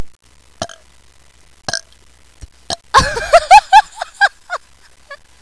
burpy

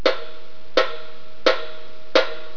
pan, stumpfiddle

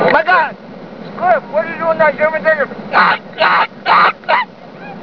yippe; Yipe Yipe Yipe! Yipe!

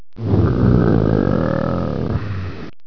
GROWL, cat; Big Cat Growling

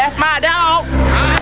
DOG

